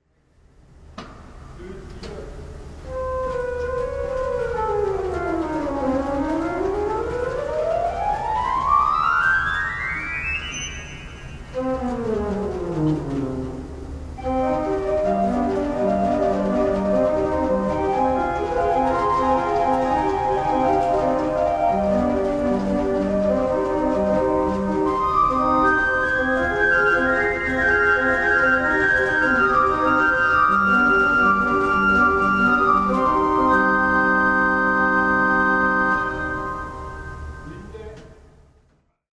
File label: flute, orgelromantik